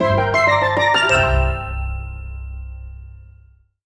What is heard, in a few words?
Chest Chime; cat quest ii